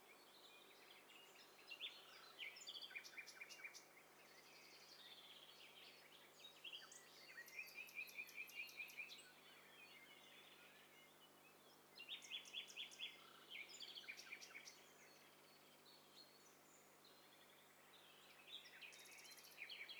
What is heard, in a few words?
STEREO BIRD COCKTAIL PARTY